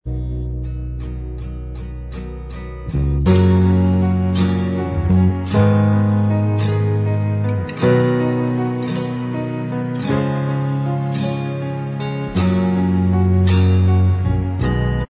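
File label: instrumental, backing track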